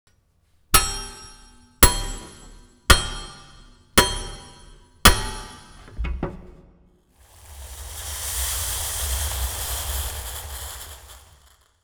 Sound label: Blacksmith Hammer and Cooling